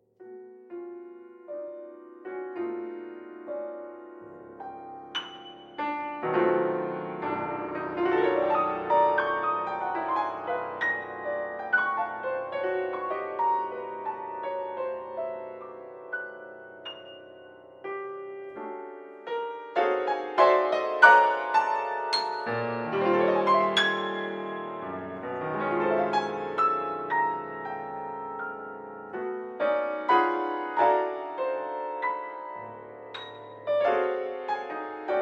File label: piano; flowing waters caress fallen petal